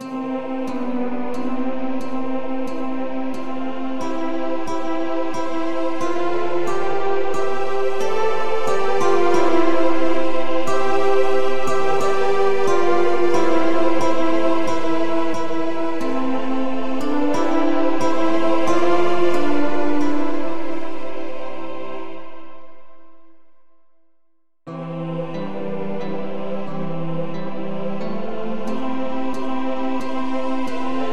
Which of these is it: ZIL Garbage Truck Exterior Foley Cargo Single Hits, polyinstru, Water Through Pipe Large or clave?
polyinstru